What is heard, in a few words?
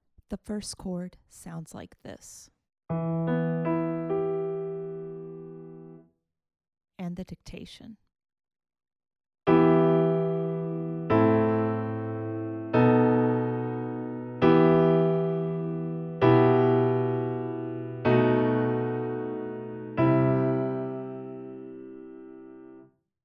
harmonic dictation